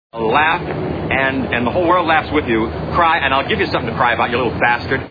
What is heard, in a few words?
laff cry